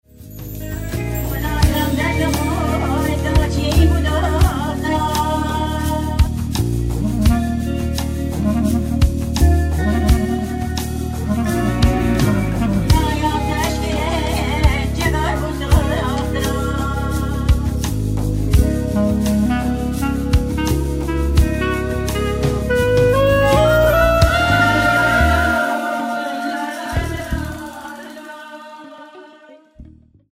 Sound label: Lullaby